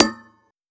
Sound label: Perc Klang, One Shots, Drums, Misc Percussion